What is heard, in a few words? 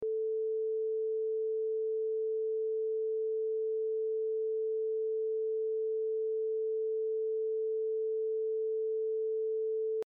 440Hz 0dBfs